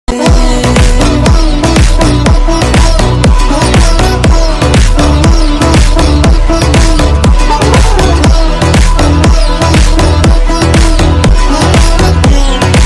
malayalam ringtone

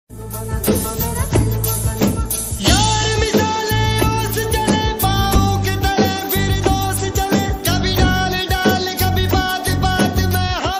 ringtone; srk

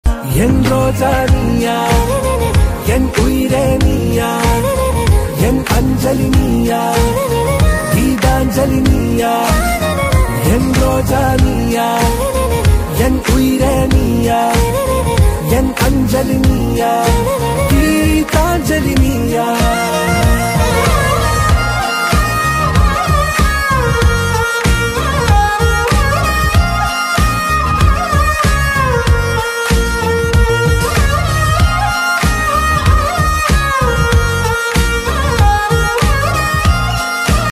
bgm ringtone music; kushi

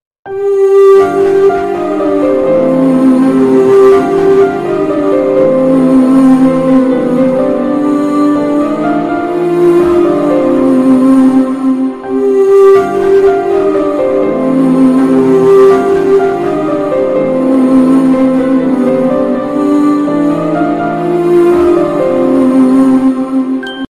sad bgm ringtone; raayan; dhanush

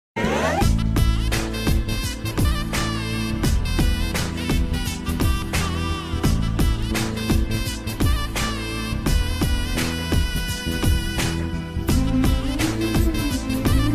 movie background music; anandam

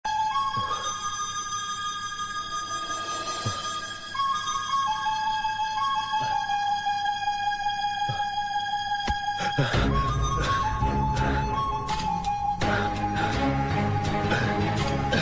instrumental; upendra preethse movie; uppi; shivrajkumar

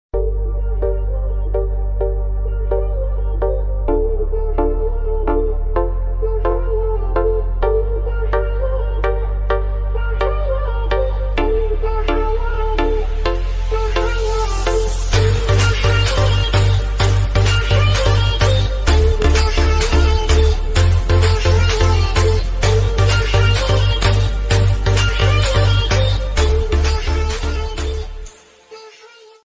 love punjabi song ringtone telugu bgm ringtone; telugu love message

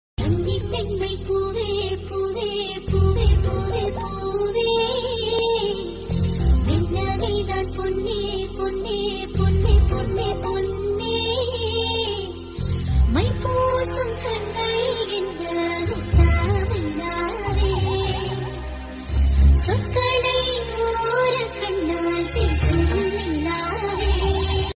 song; arundhathi movie; anushka shetty

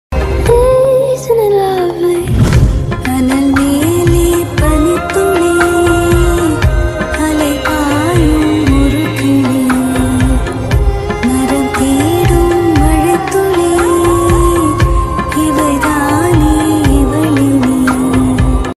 background ringtone; attitude ringtone

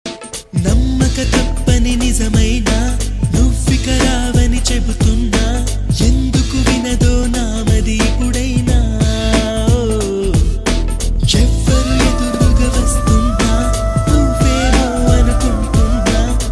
song, Telugu; bommarillu; genelia

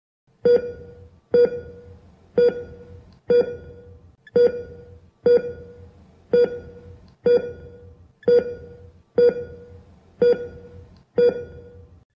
heartbeat ringtone

